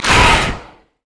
thorn plant; Zyra

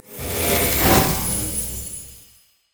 death; LoL; soraka; cleric

